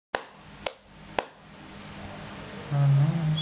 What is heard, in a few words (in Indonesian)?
tepuk tangan, human